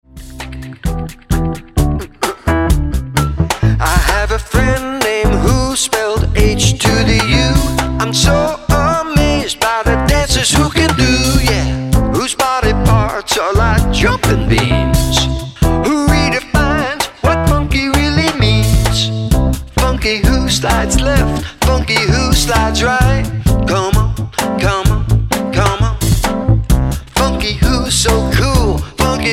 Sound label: Funky